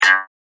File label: Twang Sound High